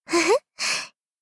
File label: laugh